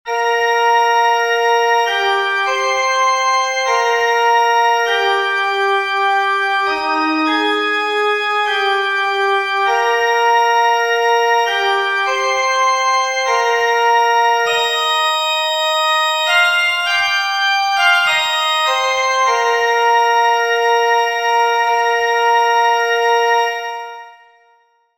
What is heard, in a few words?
Soprano; tu es petrus